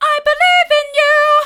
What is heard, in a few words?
i believe in you hard, vocal